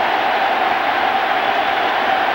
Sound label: Baseball Crowd Cheer Loop; Crowds